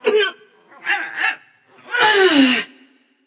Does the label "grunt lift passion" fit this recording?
Yes